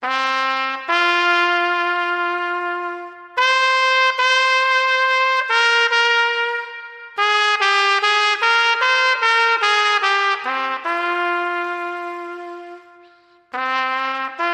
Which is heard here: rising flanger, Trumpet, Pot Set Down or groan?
Trumpet